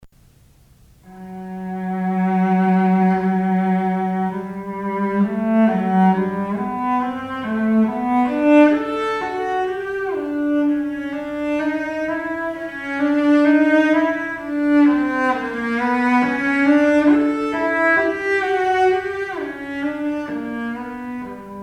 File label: cello; Brahms